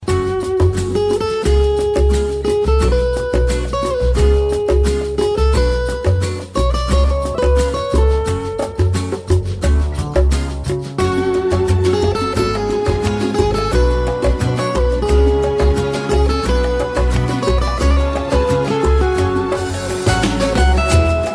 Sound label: greek music